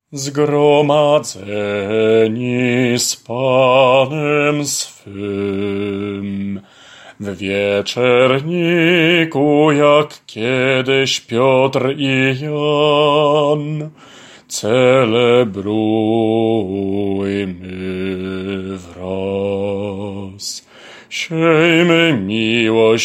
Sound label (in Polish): BAS